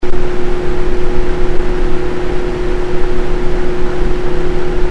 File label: Foam High; K-Tek